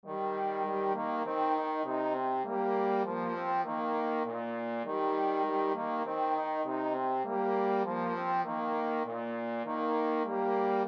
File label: trombone duet